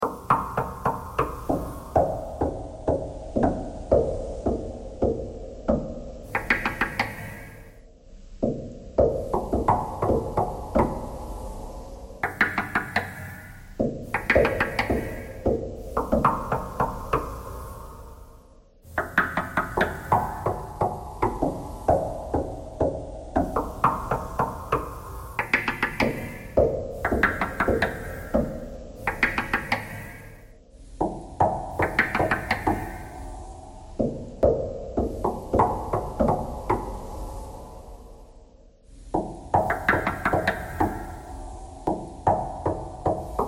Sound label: knocking thread noise